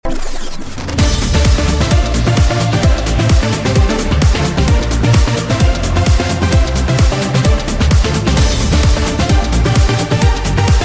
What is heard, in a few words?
trance version